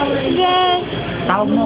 Ava Is Calling Ska

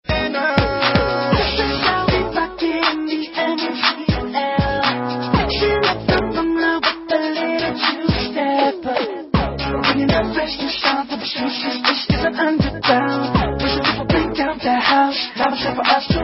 Two Step

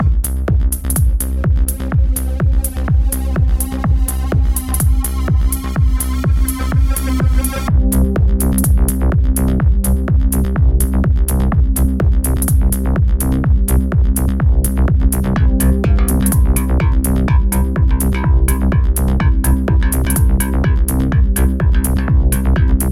minimal

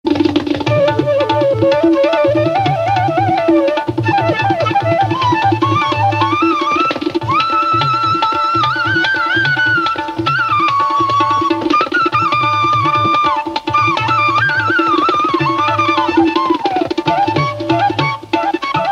sargam

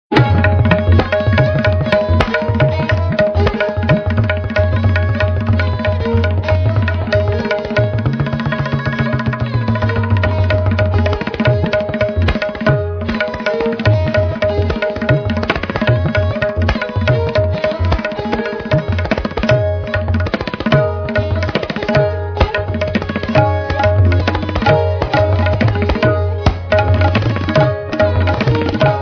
Tabla Beat

